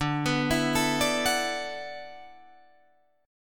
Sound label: D 6th Add 9th D, Guitar, Drop D